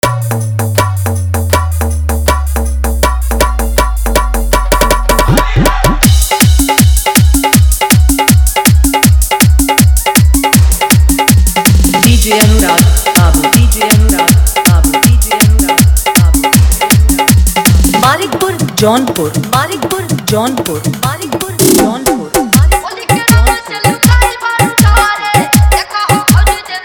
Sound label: Holi Song Dholki Bass Mix